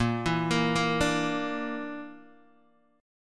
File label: Bb Major Flat 5th, Guitar, DADGAD